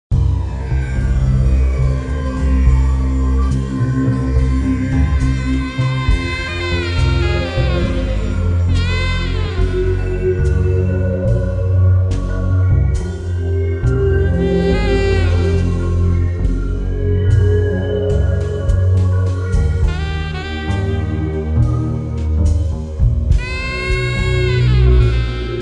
Music Bar

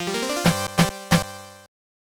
Item Pick-Up, TurboGrafx-16; City Hunter

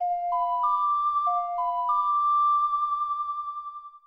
Ocarina; Legend of Zelda The Majora's Mask 2000 n64

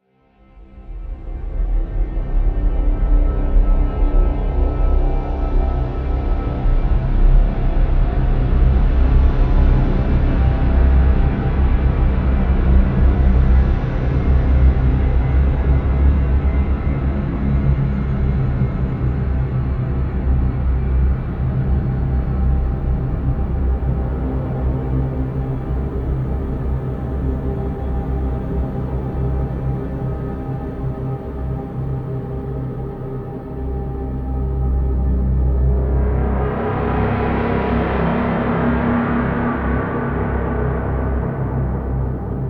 Level Start

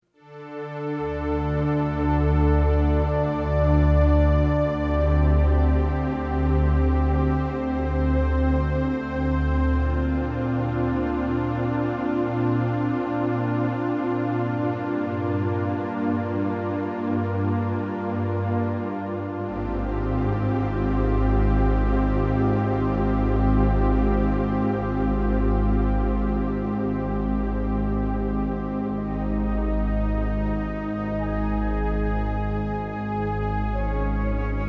Travel Ambient; windows

